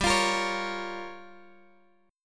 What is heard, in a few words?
G Minor 7th Flat 5th, Guitar Open D